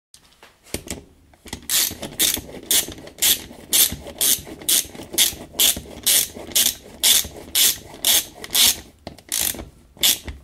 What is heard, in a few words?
Ratchet wrench; آچار جغجغه